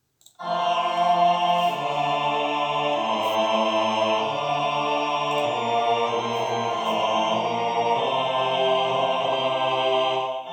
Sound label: bass; Sanctus